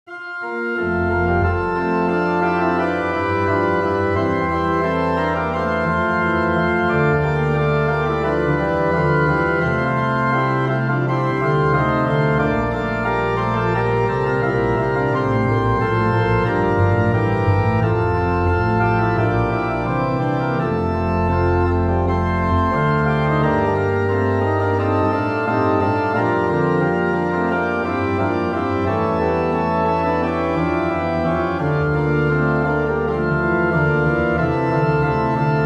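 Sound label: Choralvorspiel, organ; 1868; Mehmel; Nehringen; Bach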